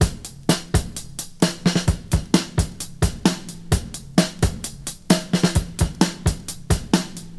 130 bpm drum loop b key, Free breakbeat - kick tuned to the B note. Loudest frequency: 1954Hz